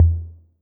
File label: 808 TL, Roland MV-8008; Roland MV8800